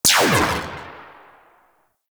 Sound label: spear gun tail; Warframe; Weapons; Corpus